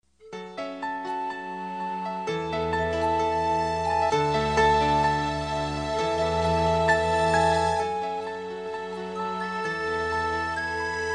Titanic Bangla

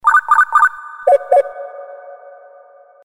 Old Phone

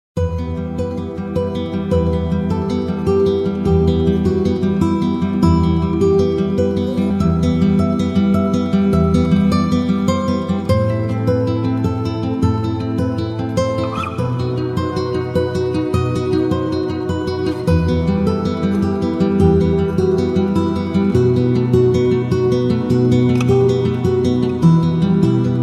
Romance

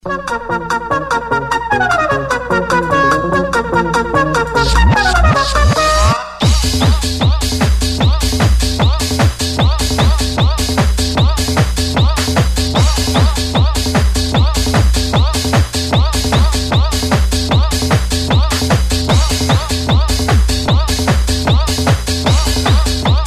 Electro; El Chavo

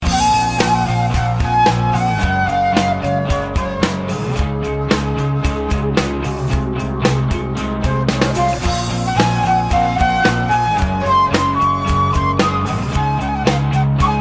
Flute Tone

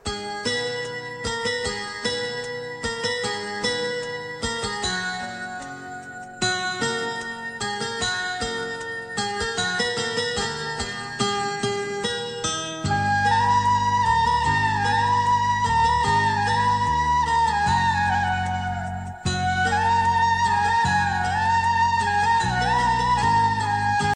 Awesome Tone

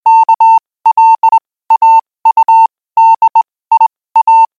Morse Cod

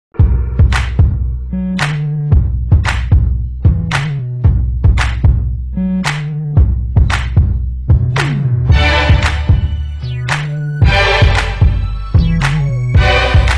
Hip Hop Low Bass

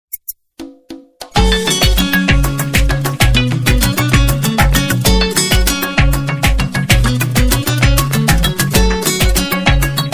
Afghani

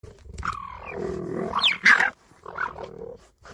Cheetah Growl

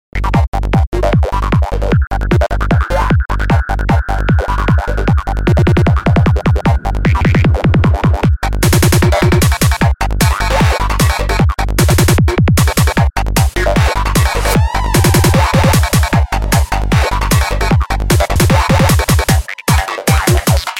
Best Beats

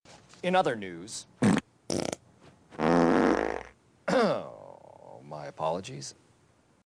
Fart Apology

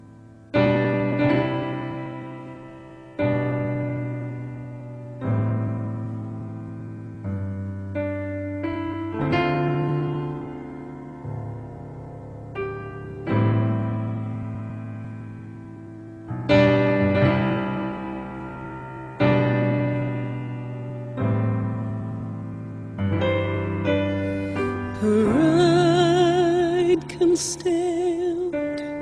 Kissing

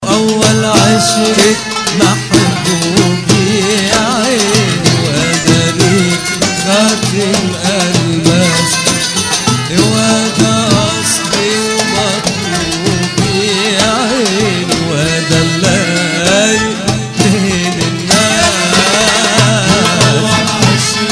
Arabic Folk

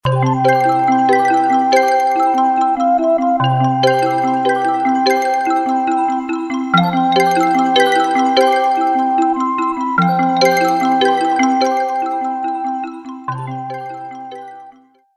Flute Ringtone

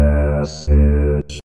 Droid Message

